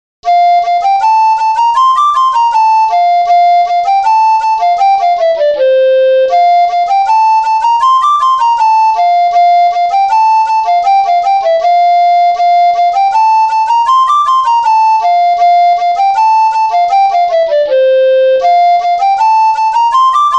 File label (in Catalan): Ball Ingles vell (flabiol sol); festa